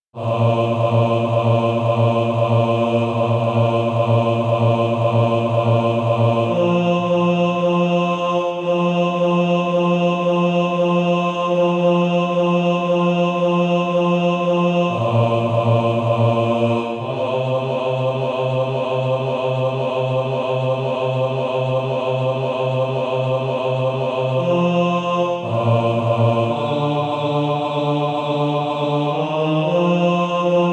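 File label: bass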